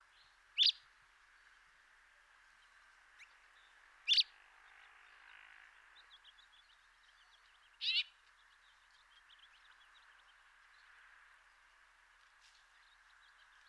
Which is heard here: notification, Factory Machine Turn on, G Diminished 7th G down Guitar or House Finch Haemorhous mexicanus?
House Finch Haemorhous mexicanus